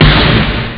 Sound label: drums snare; OctaMED SoundStudio V1